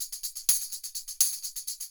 TAMBO, Ethno Techno; ILIO; BEDROCK

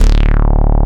SYN STBAS

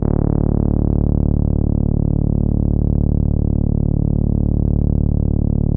MGTAURUS D1

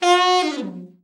ALT FALL, ALT-SAX FALL; Giga Samples Collection

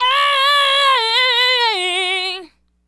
Adlib, Hip-Hop and RnB Vocals

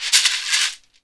SHEKERE, Synclavier Percussion; ILIO; Synclavier Percussion 2; World & Orchestral

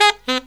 SAX; USB Soundscan vol.29; Killer Brass Riffs; AKAI

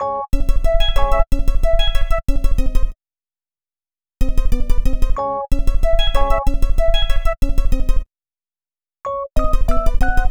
Melody, Hip-Hop

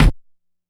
kick, Hardcore Breakbeat 134 F#min; Big Fish Audio; Hardcore Breakbeat Action; Trancefunk Mutator

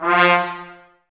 Woodwind Brass Ensemble; Miroslav Vitous

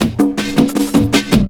LOOP L, Drum & Bass 160 BPM

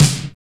SNR XC SNR; Club-50 - Foundations Roland; Cheez Snrs

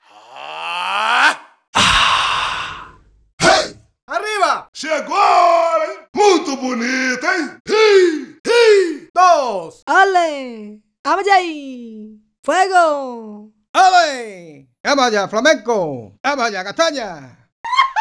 Roland BK-5 Vocals

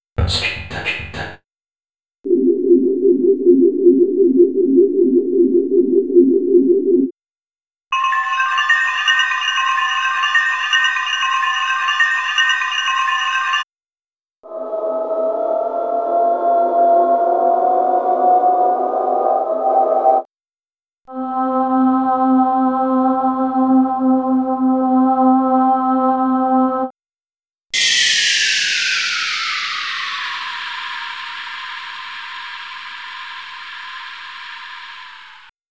Ambient; Demo Samples from Ambient; Time + Space - What's Next!

